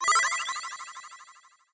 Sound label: heal